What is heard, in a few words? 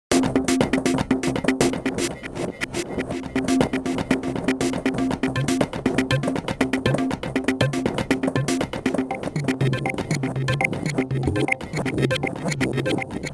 ARPS